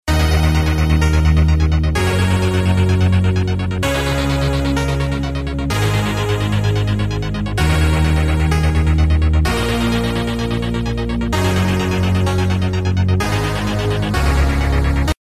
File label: Chiptune; barrier x